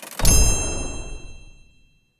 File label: booster